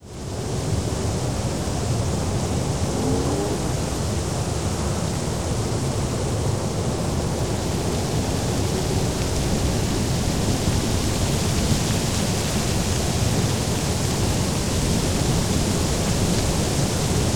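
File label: Yosem Bird wind, Yosemite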